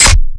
bass sliced

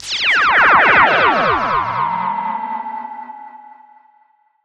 Doppler Blaster

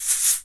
SHAKE LONG; E-MU Producer Series Vol. 5 – 3-D Audio Collection; 3D Percussives; 3D Ethnics

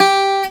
C GTR SOFT G3; USB Soundscan vol.42; AKAI

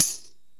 PERC & KALIMBA

